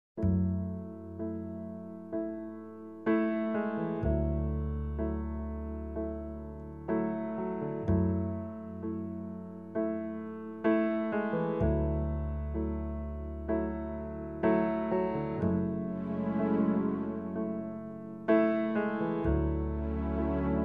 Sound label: acoustic praise, instrumental